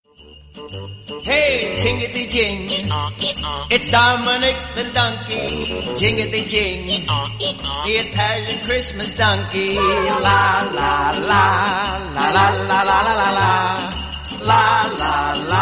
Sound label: italian xmas donkey tune